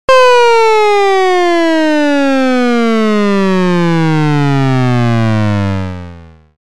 meter down; 攻撃

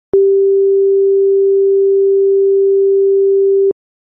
call start

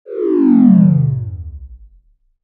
parameter down; 攻撃

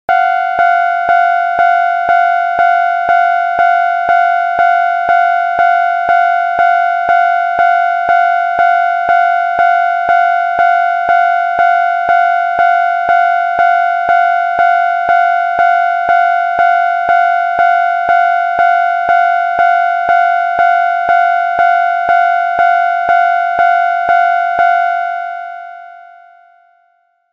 railroad crossing

